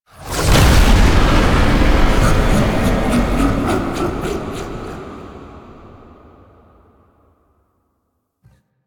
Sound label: Spawn Stinger; Enemies; Warframe; Entrati Doppelganger